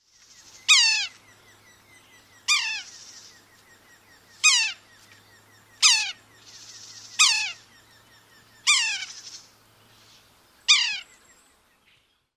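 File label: red-naped sapsucker mew calls and soft nestling calls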